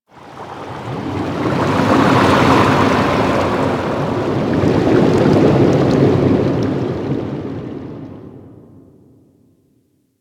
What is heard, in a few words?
Ocean Deep Sea Bubbles; Ambience; Warframe; Grineer Ocean